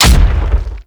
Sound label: Thumper Bot Step Heavy; Warframe; Grineer; Movement; Steps